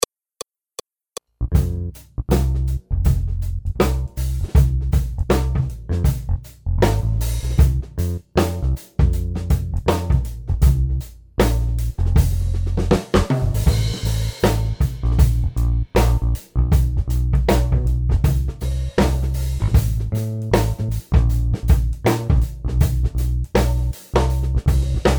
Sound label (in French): blues Accompagnement seul; Jazz